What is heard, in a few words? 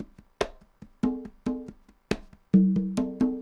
congas 140 bpm, latin; Sound Effect Library